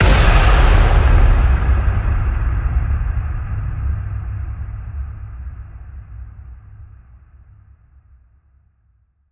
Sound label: hit big faint echo